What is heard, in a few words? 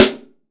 MAPLE SNARE